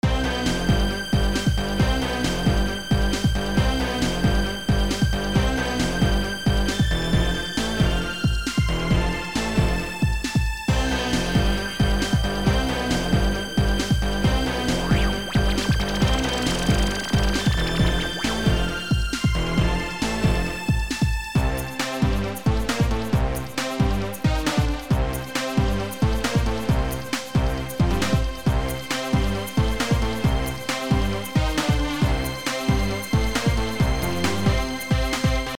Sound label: cars